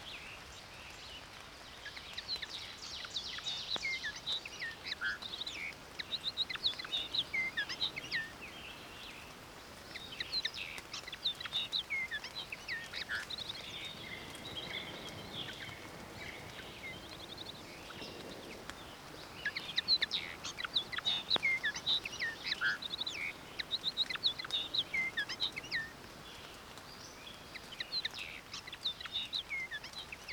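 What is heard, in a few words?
linnet; konoplyanka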